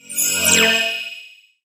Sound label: Templar Barrier of Light; Inotia 3